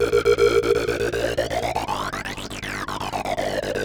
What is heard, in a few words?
Kaossilator processed (120BPM); musicradar